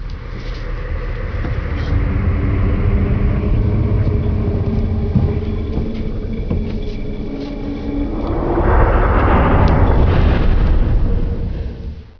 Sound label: cube move